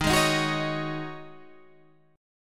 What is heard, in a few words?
Db Suspended 2nd Suspended 4th Eb